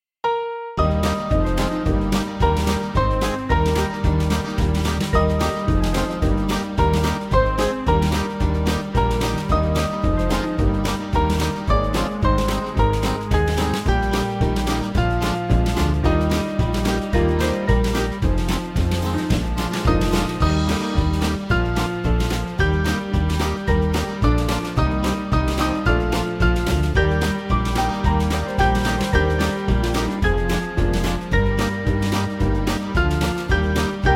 Band

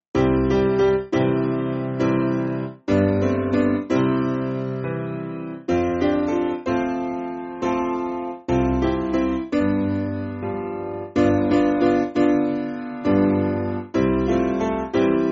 Piano, church music; Just When I Need Him

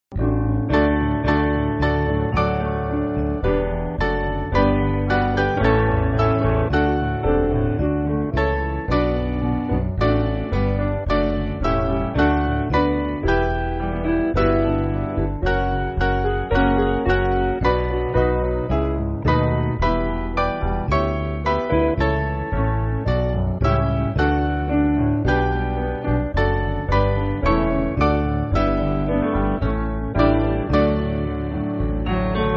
Piano

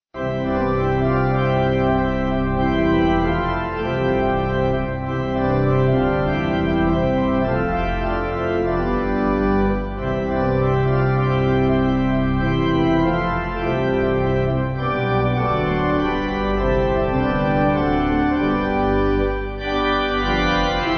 Normandy Pipe; Come Thou Fount

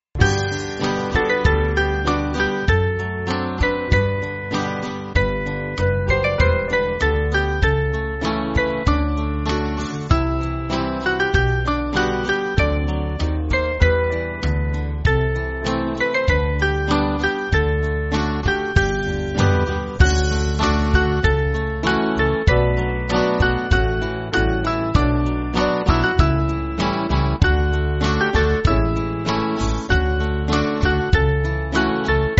Band, church music; God Our Creator

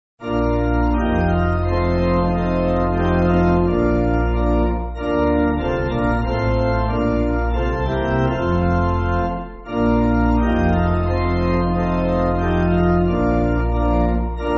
Pipe, church music; Distressed And Pitiful

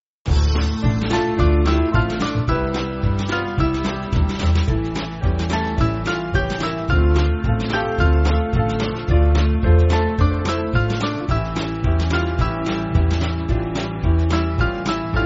Faster Band

